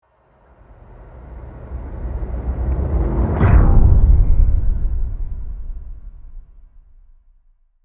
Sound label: Whoosh To Hit; Swirl, Glass, Production Element, Imaging Element, Accent, Transition; BlastWave